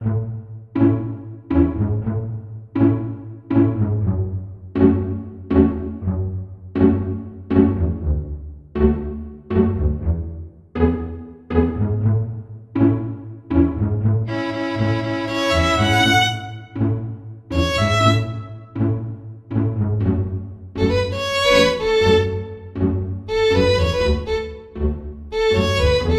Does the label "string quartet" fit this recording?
Yes